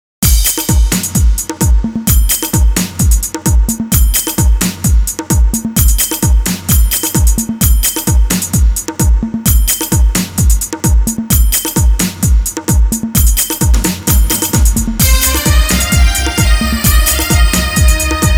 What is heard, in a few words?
BELLYDANCE HOUSE MIX